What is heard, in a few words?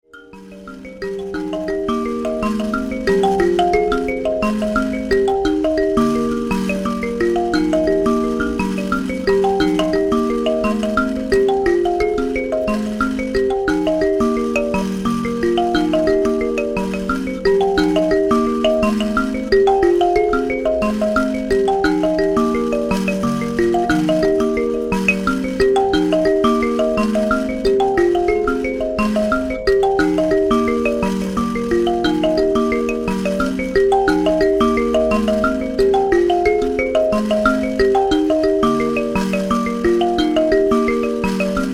mbira